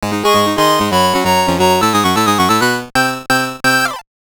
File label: Congratulation!! [Accomplished]; Densha de Go; WonderSwan